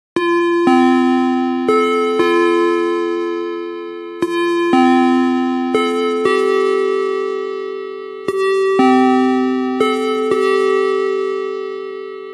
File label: chime; denon